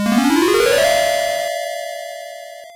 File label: Potion; prince of persia; master system